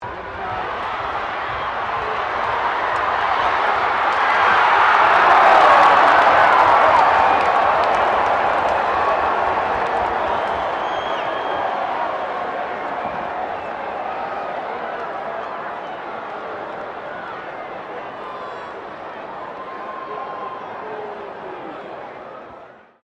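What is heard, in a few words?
crowd 15K Rugby World Cup cheer surge, Rugby world cup game; Crowds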